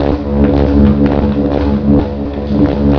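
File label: ambience EPS coil; dm criticality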